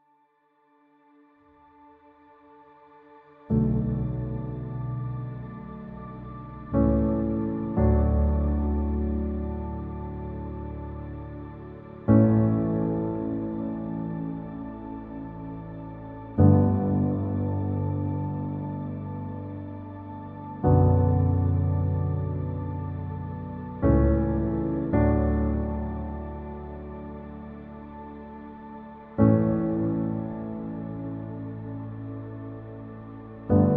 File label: Piano instrumental cover, Piano worship; The Pianist